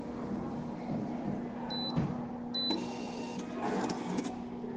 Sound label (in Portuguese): barulho do ponto